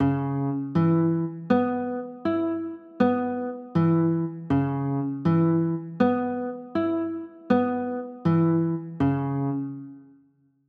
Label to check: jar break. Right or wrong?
Wrong